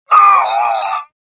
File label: death; Star Wars Jedi Knight II Jedi Outcast; XBOX; shadow